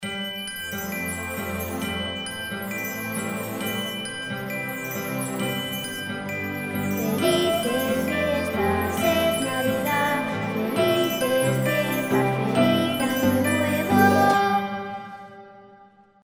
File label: christmas song cancion navidad cantante infantil singer; Canción de Navidad; Felices fiestas